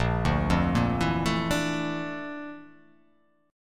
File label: Bb Major Sharp 11th Bb down, chord; arpeggiated; JGuitar